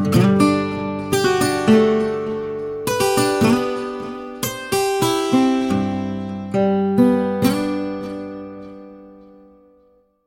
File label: guitar ringtones